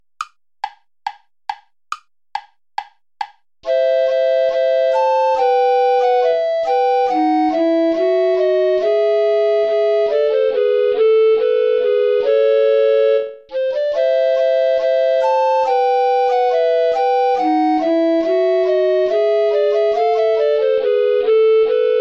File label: allemande play along without bass recorder